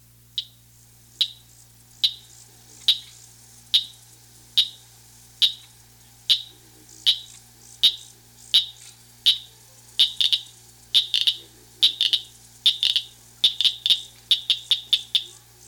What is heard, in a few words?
southern cricket frog